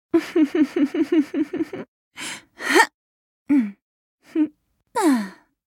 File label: laugh; Ahri